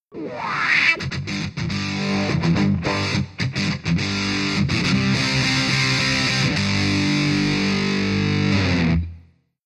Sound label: TX Rock